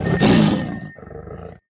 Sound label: Nekomata Attack